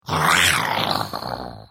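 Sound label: Attack, Khajiit female; The Elder Scrolls III - Morrowind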